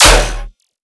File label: bass oneshot gun D